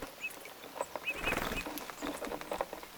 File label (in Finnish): tuollaista punatulkkujen ääntelyä